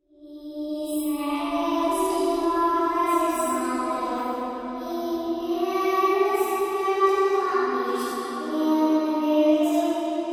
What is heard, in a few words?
creepy little ghost girl singing in an abandoned church